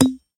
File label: shoot fail; Space Shoot